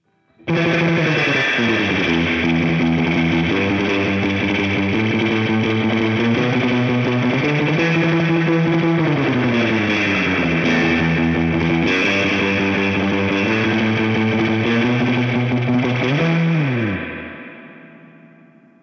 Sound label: clean Surf Guitar; ToneMasterPro